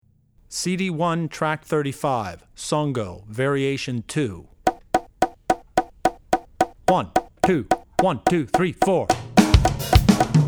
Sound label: Songo (Variation 2); Drummer's Bible Tracks